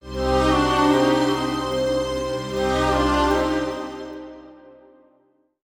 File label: Play Stinger; Goldrinn